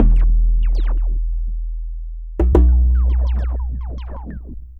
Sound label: Klong Fireworks; Sonic Foundry (Sony Creative Software); Ma Ja Le Ethereal Textures